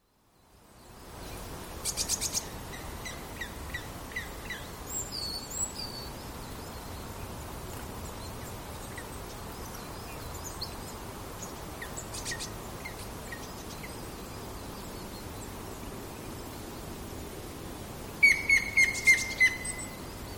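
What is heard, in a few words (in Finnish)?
harmaa varoitus; harmaapäätikka; linnut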